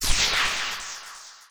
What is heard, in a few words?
Screen Flash